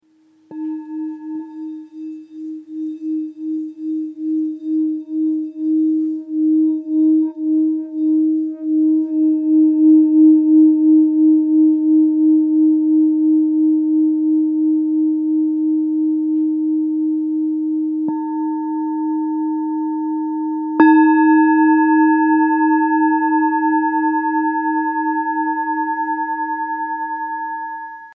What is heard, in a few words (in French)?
Bol thick sol diese